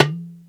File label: dumbek; Greytsounds; EXOTIC PERC